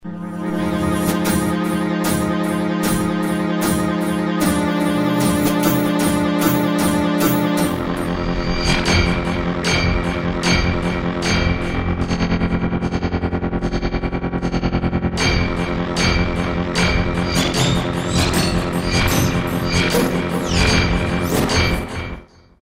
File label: Roland V-Synth; ConstructBts; Factory Patches